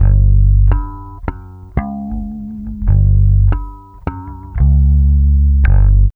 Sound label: BASS, Loops; East West